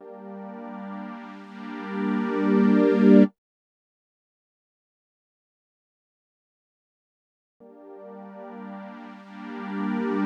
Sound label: Slow Ramp Pad, Deep Acid; Sonic Foundry (Sony Creative Software); Afterhours EDM